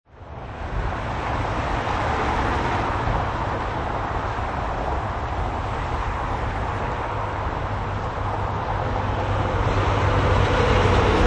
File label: Highway Traffic 65Mph, Traffic noise: Cars and other vehicles drive by on road; Traffic running at 65 mph.; Traffic And Car Sound Effects